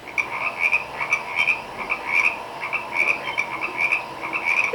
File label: frogs; Wildlife